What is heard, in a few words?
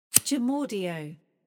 Short Cigarette Lighter